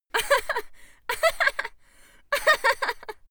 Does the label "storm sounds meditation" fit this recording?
No